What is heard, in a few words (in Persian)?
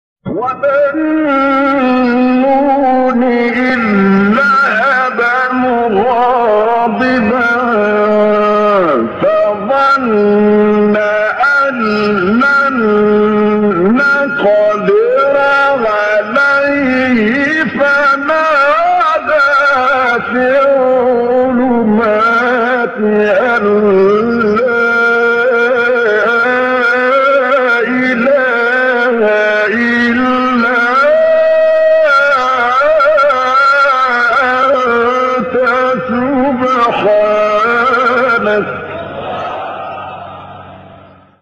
تلاوت آیه و ذالنون اذ ذهب مغاضبا; استاد طبلاوی